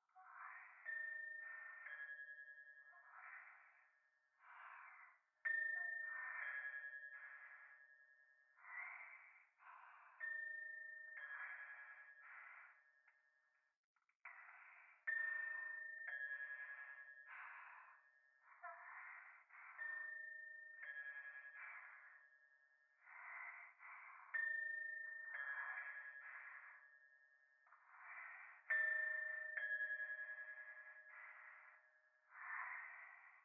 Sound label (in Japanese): トイレ 右耳 密着 吐息 神社